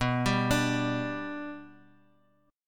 B-Minor Sharp 5th, Guitar, Open D

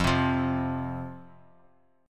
F 5th Guitar Half Step Down, chord

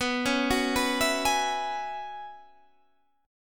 Gb 9th Suspended 4th B, chord

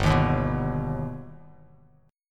G Augmented, Bass Standard; G+ strummed; 3,6,1,4; JGuitar

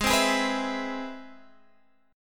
C Augmented Major 7th G sharp

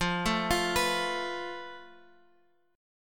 F Major Flat 5th, Guitar, Open F; FMb5 arpeggiated; JGuitar

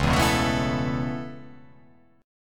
C sharp Minor 7th Sharp 5th

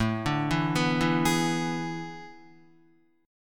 A Major 7th Suspended 2nd Suspended 4th, Guitar; arpeggiated

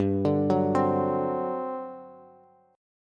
G Major 7th Suspended 4th, Bass; GM7sus4 arpeggiated; JGuitar

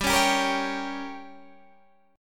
G Diminished

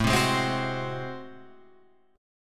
A Minor Major 13th A, Guitar, Open F; AmM13 strummed; 9,7,8,7,9,7

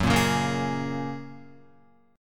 F sharp Major Add 9th F sharp, Guitar; F#add9 strummed

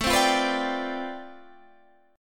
D Minor 13th A

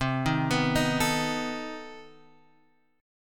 C Minor Major 13th C down Guitar Open G

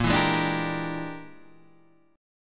E 7th Sharp 9th Flat 5th A sharp, chord

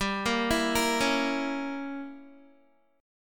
G Diminished 7th, Guitar; Gdim7 arpeggiated; 15,13,14,15,13,x

